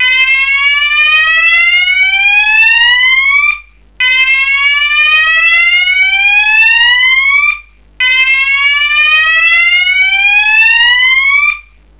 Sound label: slow whoop